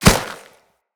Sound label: Kubrow Step Snow; Footsteps